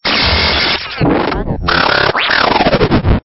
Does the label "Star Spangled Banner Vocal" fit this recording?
No